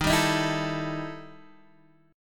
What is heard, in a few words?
E Minor Major 7th Double Flat 5th D sharp, guitar